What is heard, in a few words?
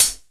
natural pedal hi hat sample f key, Royality free pedal hi-hat sound tuned to the F note